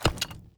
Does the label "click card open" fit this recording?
Yes